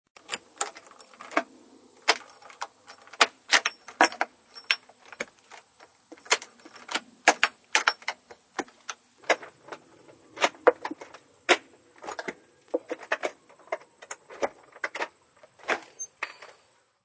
Trouble getting into front door as lock is twisted and; Trouble getting into front door as lock is twisted and banged; Miscellaneous Door